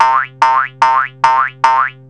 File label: Cartoonish Sound; PC; MARIOTEACHESTYPING2